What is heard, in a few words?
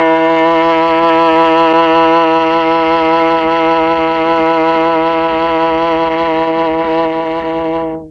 E2 VIOLA, Mellotron; Mike Pinder - Mellotron Archive CD